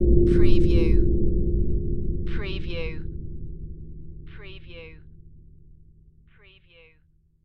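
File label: Production Element Hit Dark; Sound Effects Bible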